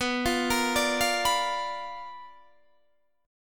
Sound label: B Minor Major 7th Flat 5th, Guitar, Open D